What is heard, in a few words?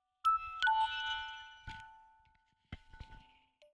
melodic fx B; base pack